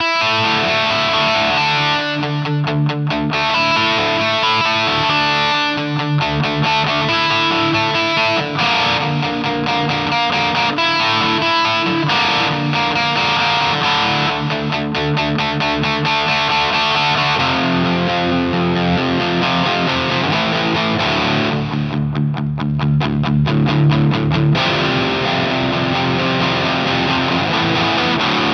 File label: Harley Benton DNAfx GiT Amp J800